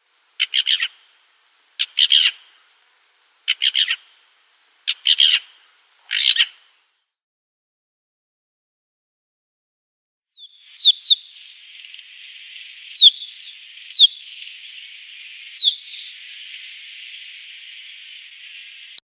Black Wheatear calls

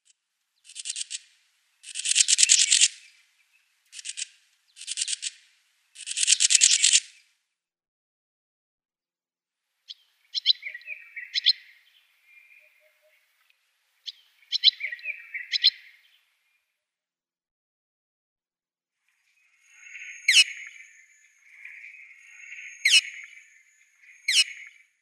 Wire-tailed Swallow calls

